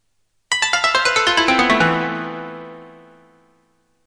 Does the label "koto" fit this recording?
Yes